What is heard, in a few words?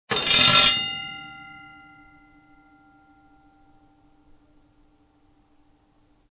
Starting Bell short